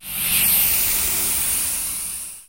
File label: pickup oxygen; diver jimmy